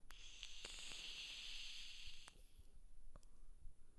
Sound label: Vaping